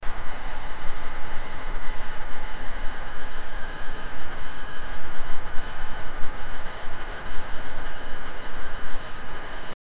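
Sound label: industrial abandoned place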